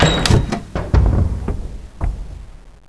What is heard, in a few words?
button